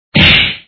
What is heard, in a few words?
Good Smack; Dad 'n Me